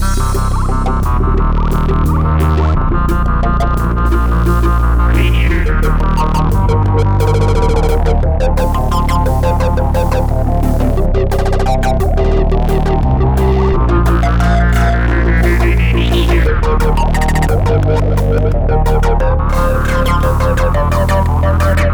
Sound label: Asteroids Inside Medium; rive wreck hack die retry; ps4